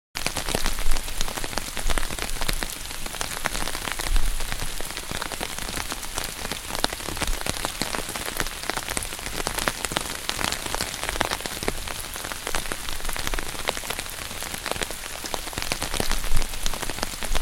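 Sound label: pleasant rain sounds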